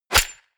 golf ball hit

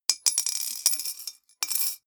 piggy bank coins put in

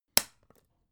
folder clamp close

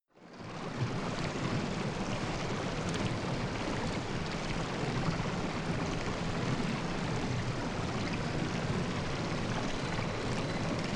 water fx

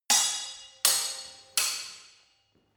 sword hit fast sword triple

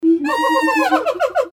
gibbon monkey

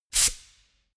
pneumatic pump quick burst

